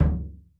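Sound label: bass drum SFT, 50S JAZZ; Northstar - New Gold VOL-1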